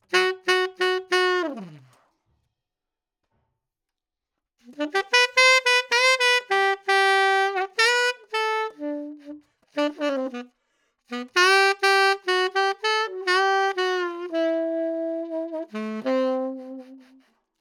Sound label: Sax; AUSTRIAN